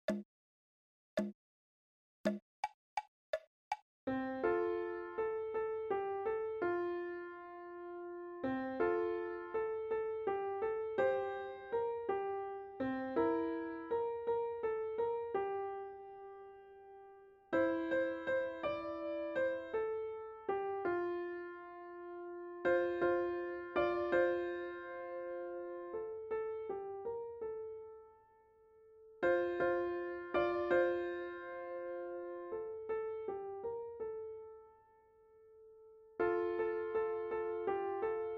Voici Venir right

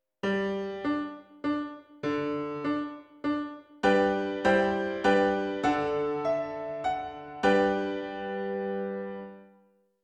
Piano

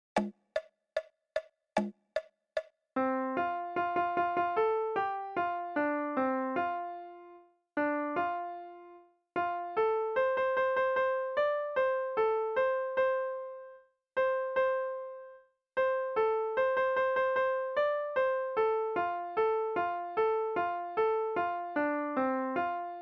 Frog Went A Courtin right

